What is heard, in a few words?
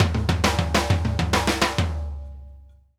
Drumworks SP fills 132